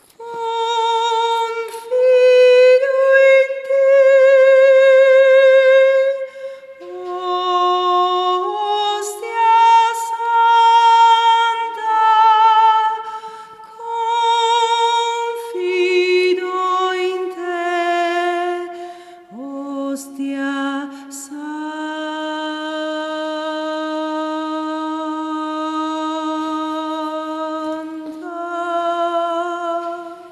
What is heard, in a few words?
O Ostia Santa Soprani; ARCIDIOCESI DI SANT’ANGELO DEI LOMBARDI-CONZA-NUSCO-BISACCIA